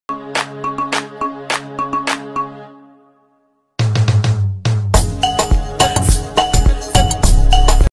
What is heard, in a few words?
BGM ringtone